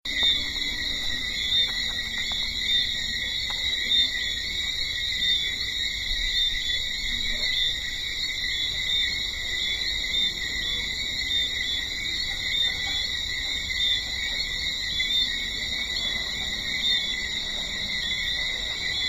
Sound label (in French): Bruits du soir a Bois de Rose; Guadeloupe